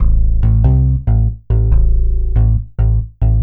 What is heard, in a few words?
TECHNO BASS 140 A